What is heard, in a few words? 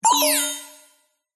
science fiction cyberpunk robot or equipment beep